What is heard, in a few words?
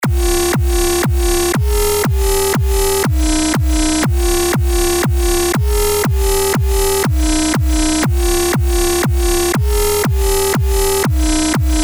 Kick Ful Tech Sequenz